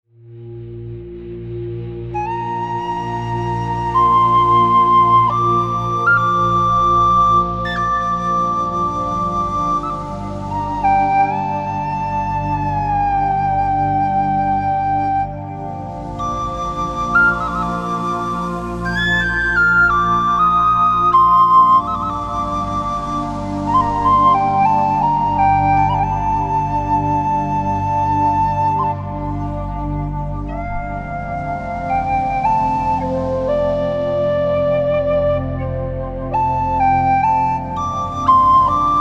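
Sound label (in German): Flöte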